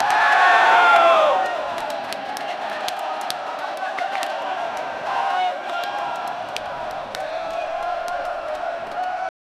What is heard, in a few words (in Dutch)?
spaanse galjoenen die teg, ships